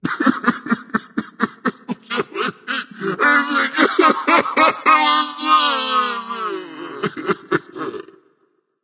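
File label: nonverbal boohoo; smite; voicepack; merlin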